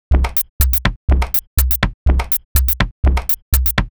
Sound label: Top 123bpm, House Drumloops; Zero-G House Fabrik Drumloops